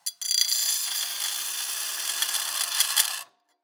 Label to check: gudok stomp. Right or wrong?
Wrong